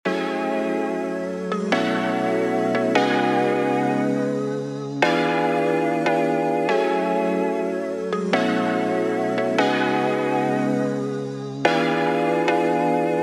Tropicana loop

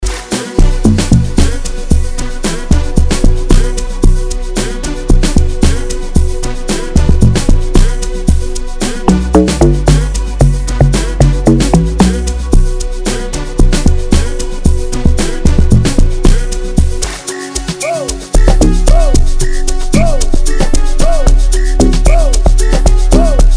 Amapiano; 2025 super amapiano bundle by sliquejams

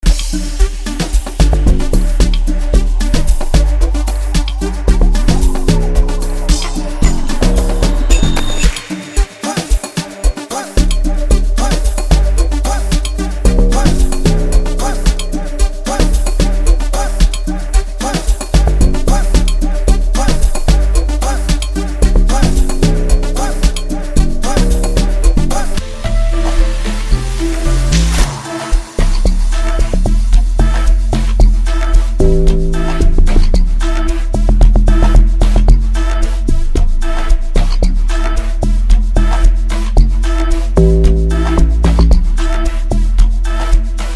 Soweto Amapiano

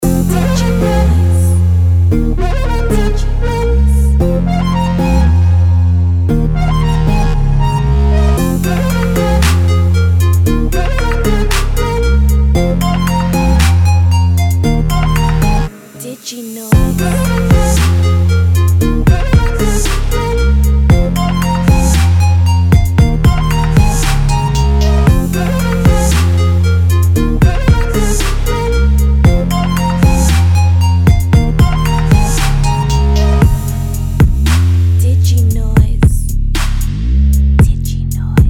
Sylenth Trap; Diginoiz; Sylenth Trap Chiefs 3

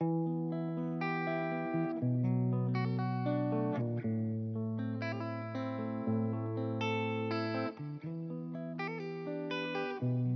are n bee 120 guitar

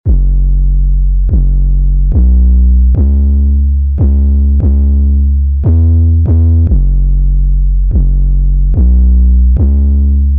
destruction loop

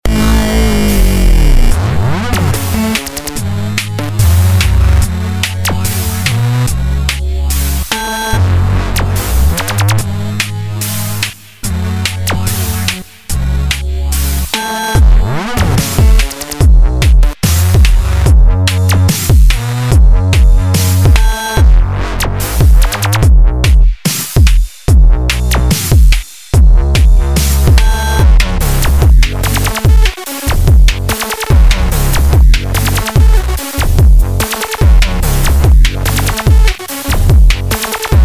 Dubstep Sylenth Kit; Diginoiz; Dubstep Stars

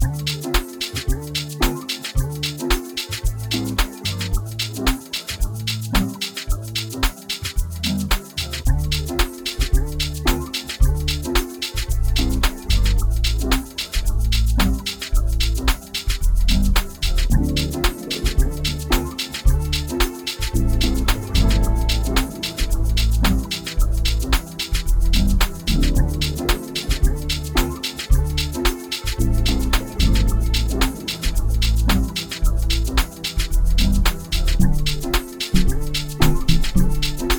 C major 111BPM; Matjato

